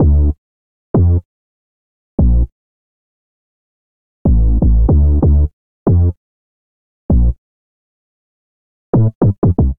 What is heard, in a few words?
Sub Bass D Minor 88, Bassline; MIDI Clips; New Old; Ableton; Factory Packs; Chop and Swing